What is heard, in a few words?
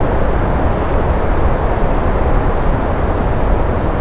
Jungle Soft Airy Wind